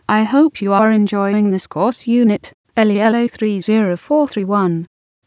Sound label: synth